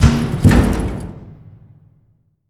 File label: Pressure Plate Down